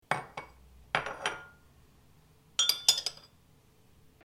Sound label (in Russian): chashka kofe